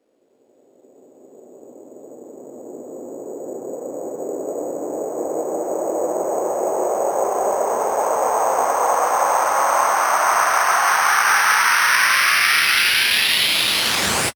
FX Uplifter

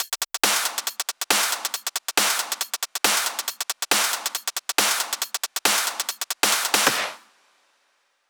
Soothsayer Kit 138 BPM Loop, Drums & Perc; Bminor

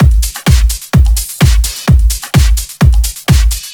Housebeats 128BPM; Vengeance Samples

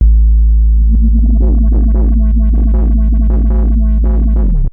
Roland B A1, Roland S100; musicradar-synth-samples

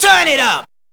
Vocal Phrases; Vengeance Samples; Vocals

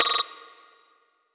hat; Dubstep Sample Pack

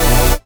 Synth Shots; Vengeance Samples

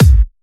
Bassdrums UK Trance Kicks

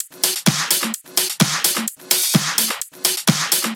Houseloops 128BPM; Vengeance Samples

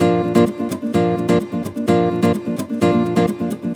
Nylon Guitar Kit, A# maj, 128BPM; Vengeance Samples; Special Sounds

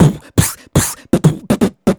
Beatbox Loops, Human Beatbox

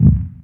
Minimal Perc; Minimal Percussion

